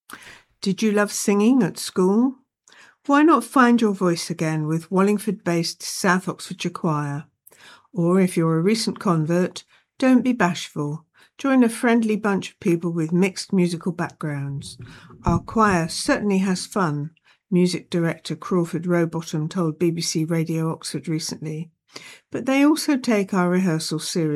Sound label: Choir; R & A South Oxon Choir